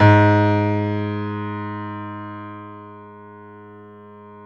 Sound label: PIANO FF G#2 L